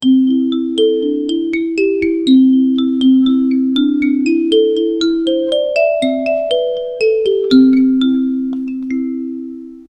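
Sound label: upbeat cheerful background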